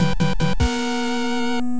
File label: Player Miss; Kick Master; NES